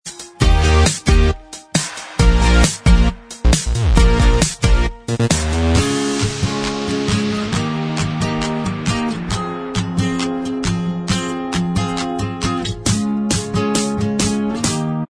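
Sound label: instrumental, backing tracks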